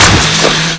chainsaw hit; weapons

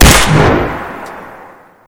rail crit; weapons

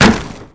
pumpkin action breakthrough, weapons

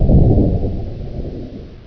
distant mortar, ambience

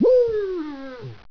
houndeye die

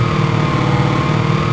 tele attack

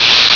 spark, buttons

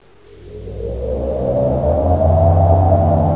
machine on

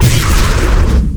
booster exp; weapons

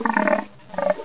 bullchicken idle

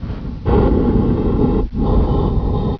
gar breathe

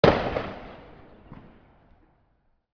nyan fire

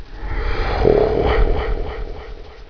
laugh

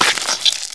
bullchicken bite

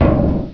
player metal

